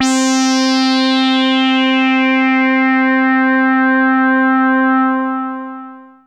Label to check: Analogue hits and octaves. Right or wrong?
Right